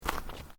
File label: Footsteps Boots Road Gravel Step; Footstep Foley; Human